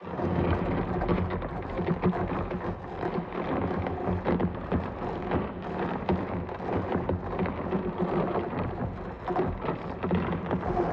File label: FOREST ROOTS CRAWLING ROOTS D PHRYGIAN 80 BPM